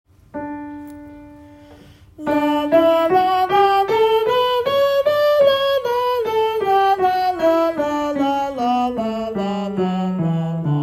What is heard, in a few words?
soprano; Audition